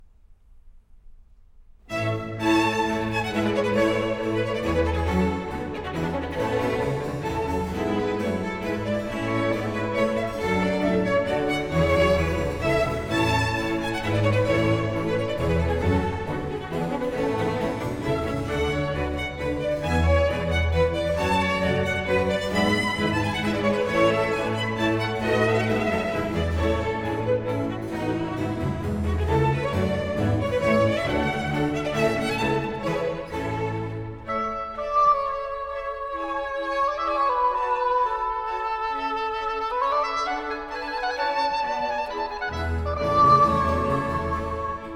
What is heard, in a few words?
Oboe d'amore Concerto in A Major; Bach; J.S. Bach